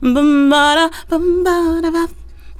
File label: FEM VOX, Hip Hop